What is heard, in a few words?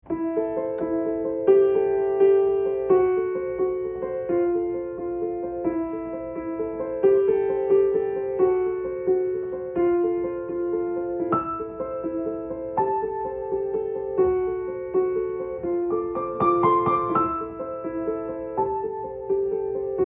piano